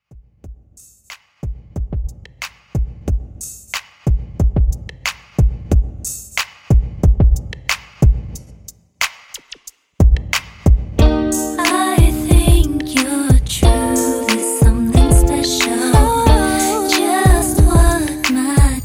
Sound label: 91 Bpm; Redrum